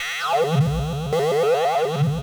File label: S&H Snip E, Noise Kit; Cyclotronic Resonator